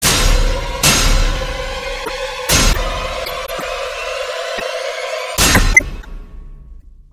groom scare; slender; outlast

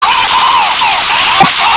chase init; slender

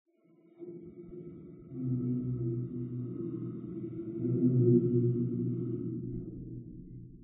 animatronic moan; slender; tjoc

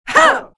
attack, spirit; slender; dbd

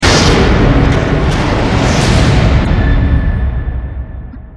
catch; slender

